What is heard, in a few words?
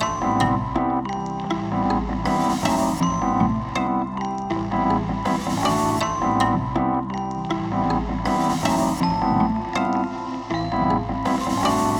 Drones & Textures